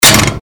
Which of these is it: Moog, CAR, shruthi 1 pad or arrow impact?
arrow impact